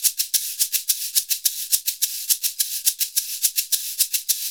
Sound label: SHK, Shaker; Univers Sons; Basicussions